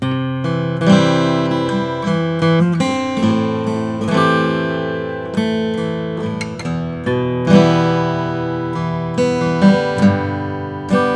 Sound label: Santa Cruz om natural